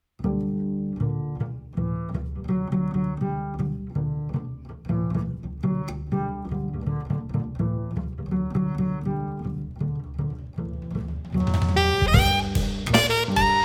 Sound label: Birds lament